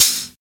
studio open hat e key, Royality free open hi hat sample tuned to the E note. Loudest frequency: 6942Hz